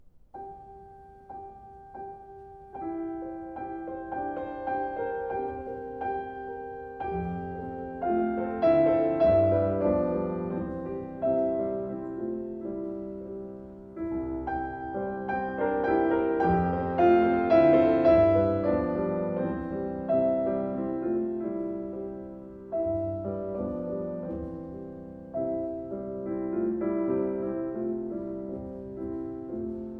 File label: Ballade No. 4 In F Minor